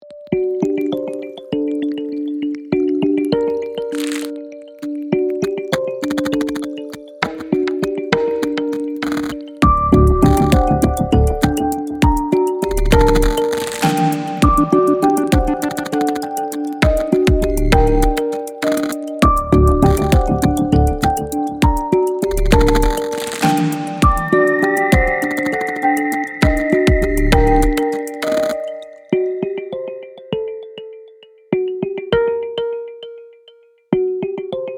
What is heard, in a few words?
kalimba dub